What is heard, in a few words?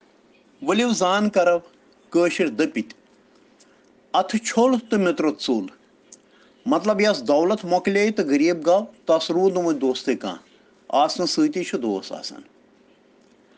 Children Rhyms in Kashmiri